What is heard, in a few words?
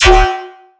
bass hit strong yoink D; Virtual Riot